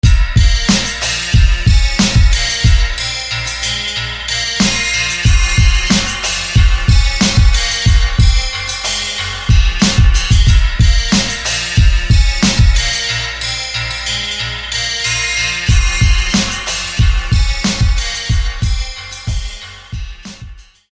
Guitar; Trident; SFB FX

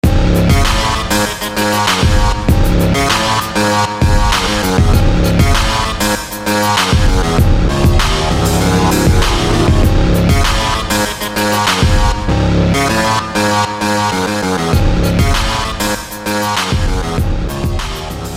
Gritty Synth; SFB FX

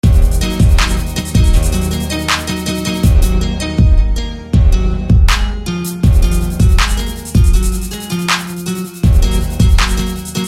Far Octave Guitar

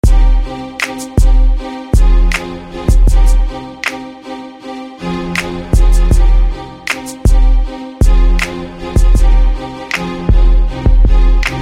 Blockbuster Strings; SFB FX

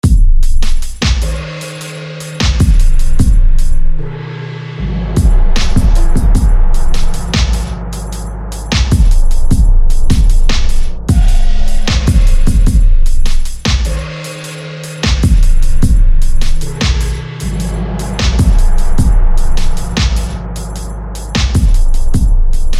Gong; Templed; SFB FX

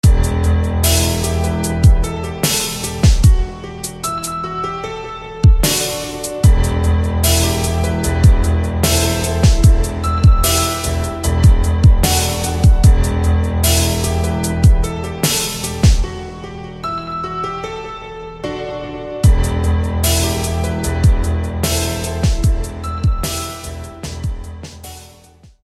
White Keys; Saint White Keys; SFB FX

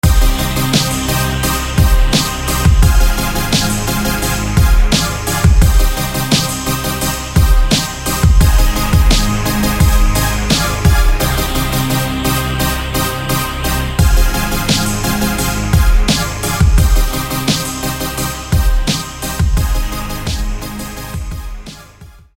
End Gate Synth

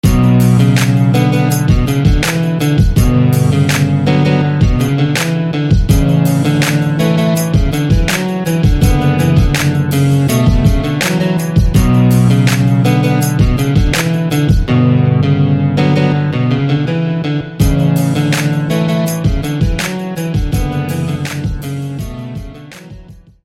Guitar; Blacktooth; SFB FX

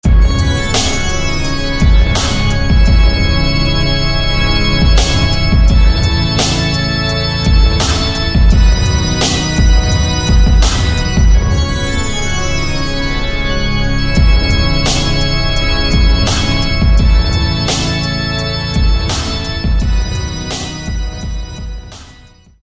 Organ; Judgement; SFB FX

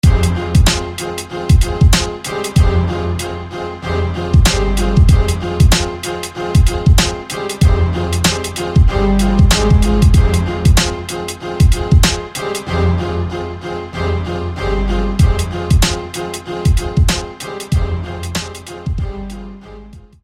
Stac Strike; SFB FX

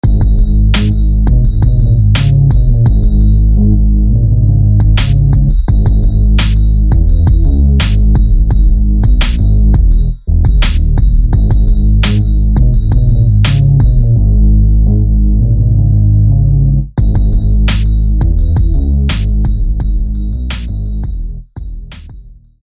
Bass; Bass Before the Storm; SFB FX

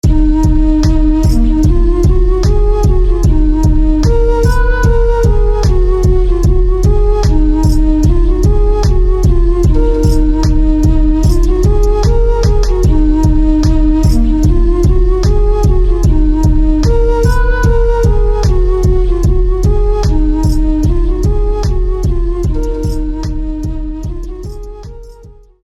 Mountain Wind; SFB FX

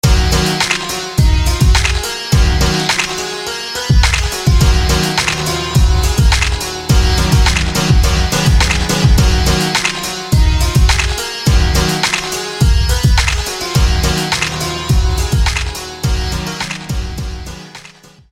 Mighty Sitari; SFB FX

